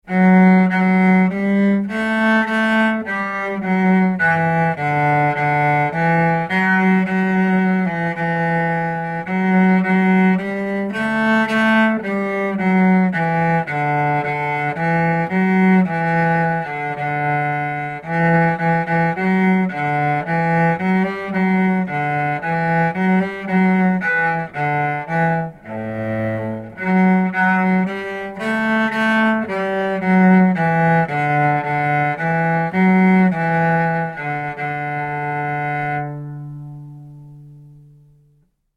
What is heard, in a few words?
Cello